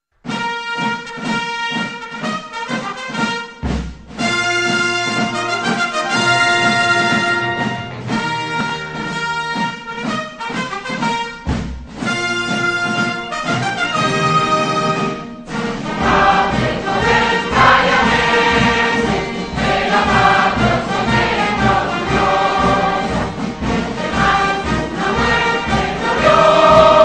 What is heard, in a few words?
cuban national anthem